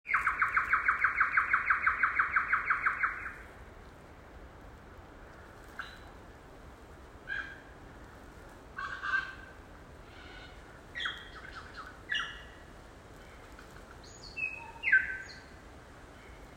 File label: Lyrebirds on the Lyrebird Trail